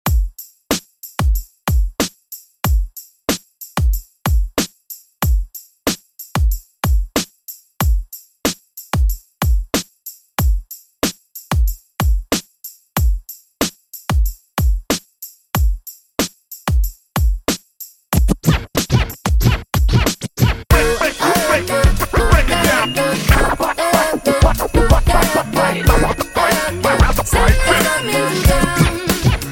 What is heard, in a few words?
Scratch Xmas, 93 Bpm; Redrum